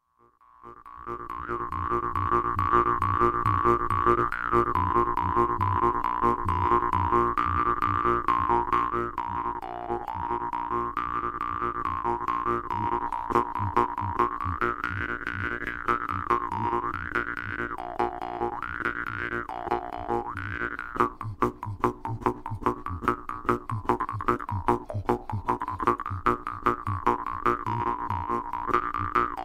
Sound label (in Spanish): Birimbao, Percusión; Recursos para Percusión